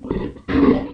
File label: grunt; snapfire; mortimer